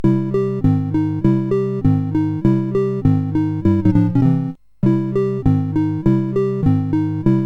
sid CSG8580R5, GAMES; Donald Ducks Playground

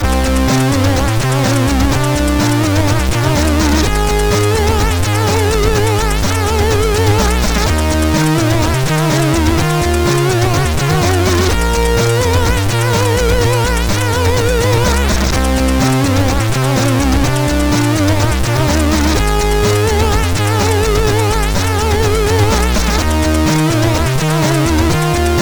Good Blark, MOS6581R3

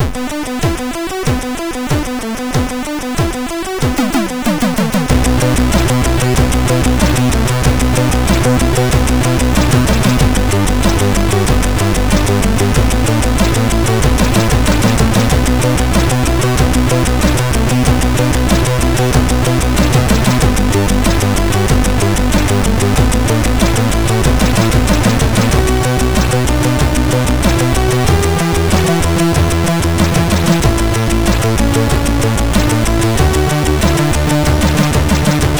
Short Tune sid MOS6581R4

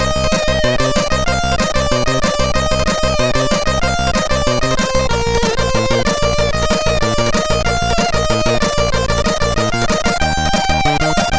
sid MOS6581R3; Neon Dreams

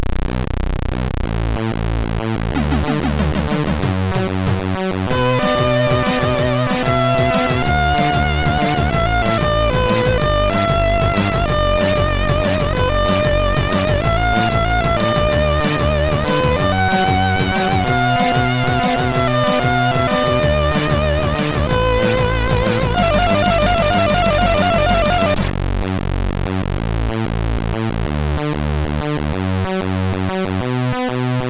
jazz, MOS6581R3

